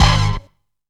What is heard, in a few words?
SQUEAL STAB; Zero-G; Funky Elements